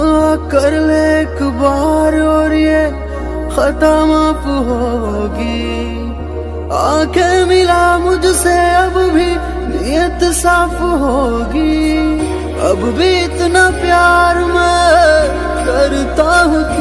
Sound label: Very Sad Ringtone